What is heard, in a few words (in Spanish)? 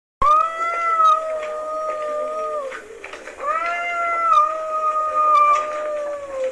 aullido gato